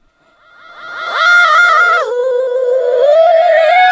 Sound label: REVERS FX, REVERSE FX, Funky Vocals